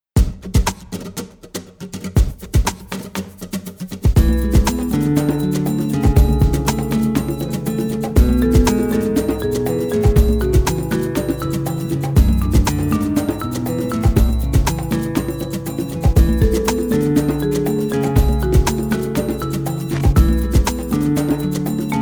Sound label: Bike Riding Fun